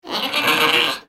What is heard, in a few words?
Pets Goat Angry, creatures; Lego